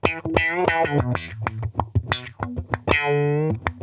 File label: Funk Guitar; Zero G - Funk Guitar